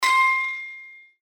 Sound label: Sword Sound; Fighting Sounds; FXHome